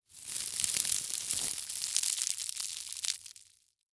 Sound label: Household Broom Bristles Crinkling